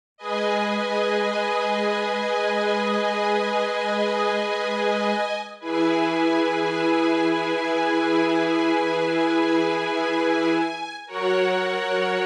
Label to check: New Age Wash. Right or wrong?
Right